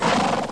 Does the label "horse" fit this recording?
Yes